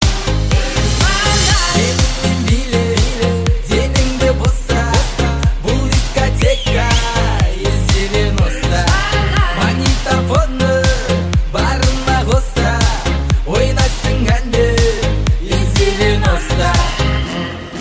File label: diskoteka